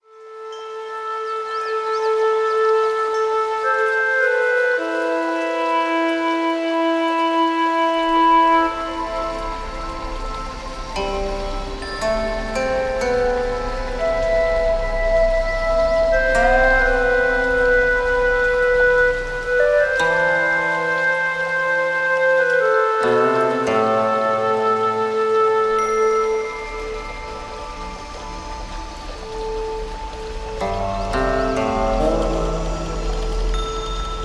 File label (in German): Entspannungszeit Tai Chi und Qi Gong Wind und Wasser